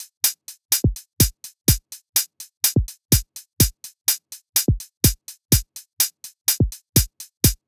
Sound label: Slap House Kit 125bpm Top, Drums; EDM Drums; Drum Kits; Stems; Ghosthack; Ultimate EDM Drums; Slap House Kit 14